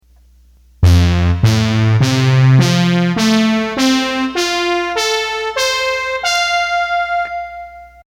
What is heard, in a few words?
Horns, accordion